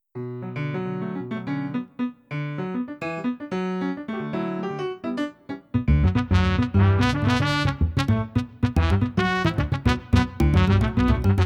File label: Jazz